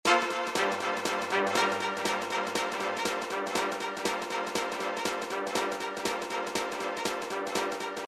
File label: conga